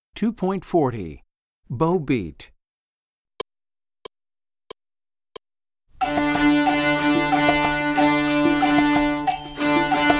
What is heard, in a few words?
Bow Beat